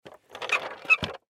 Toy Shopping Cart, Toy Shopping Cart Movement Wheels Plastic Push; Foley; Blastwave